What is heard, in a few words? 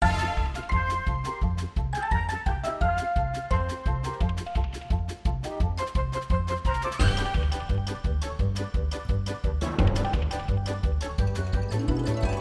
Minigame Race; MLP Friendship is Magic (Mobile Game); My Little Pony OST Repository